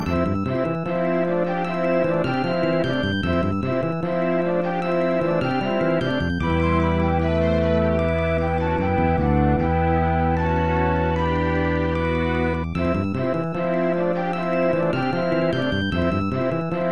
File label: A Bell Is Tolling